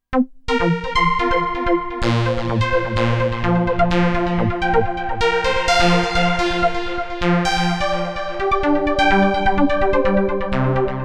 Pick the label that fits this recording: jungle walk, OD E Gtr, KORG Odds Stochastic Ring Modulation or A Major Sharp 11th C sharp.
KORG Odds Stochastic Ring Modulation